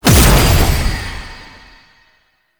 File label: Cast Impact; Warframe; Uriel; Remedium